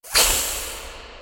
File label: HISS; zoochosis; deep sea; lazynap